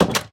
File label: close, wooden door; minecraft; block